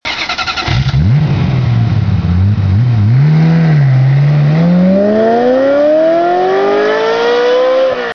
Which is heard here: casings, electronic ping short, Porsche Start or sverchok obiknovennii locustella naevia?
Porsche Start